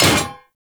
Melee Hammer Impact Generic; Warframe; Hammers

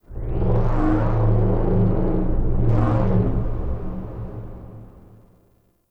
Low Growl; Warframe; The New War; Zariman; Apartment; Scene Six

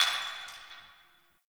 Distant Glass Breaking

